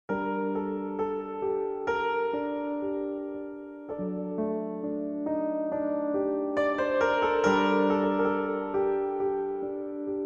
Piano Improvisation

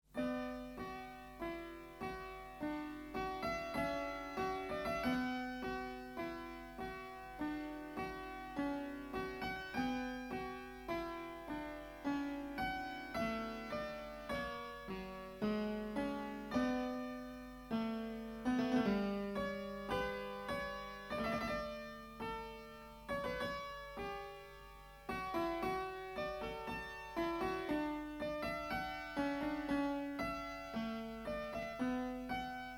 Baroque Song

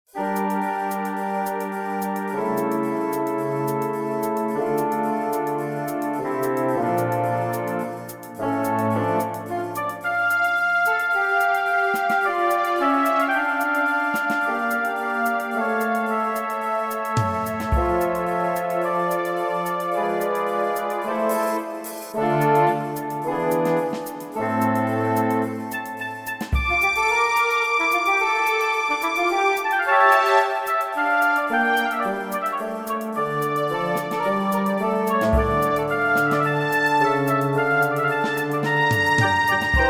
Baroque Intermezzo

